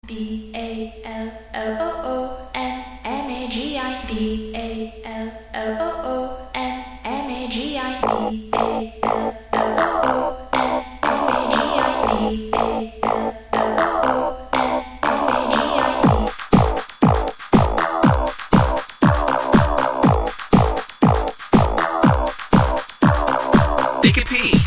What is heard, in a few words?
balloon Tune Short